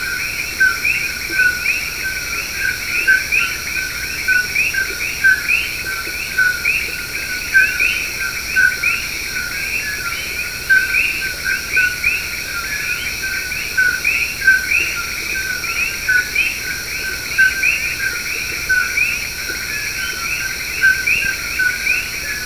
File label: coqui